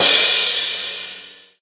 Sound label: hitsound percussion